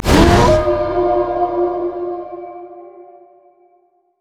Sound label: wolfman bloodscent enemy; LoL; warwick